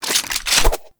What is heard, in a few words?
Reload, Weapons; Warframe; Tenno; Protea; Velox Prime; SMG